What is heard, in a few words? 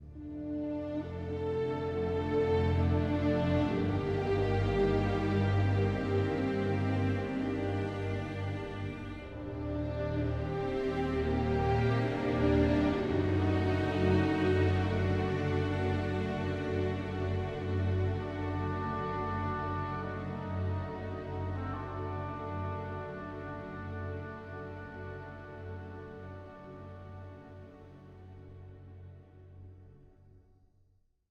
Sandstorm 68varbpm 4-4meter; Planet of Lana